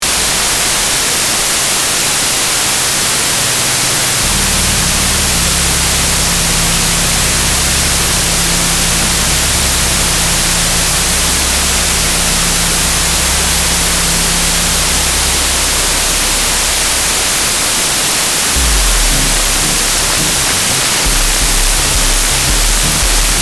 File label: craftsynth 2